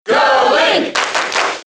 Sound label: Cheer; SSBB; Link